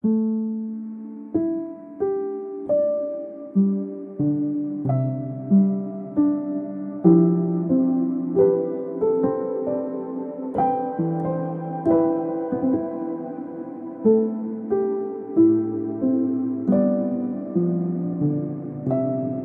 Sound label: Piano Song; Medusa's Heart of Stone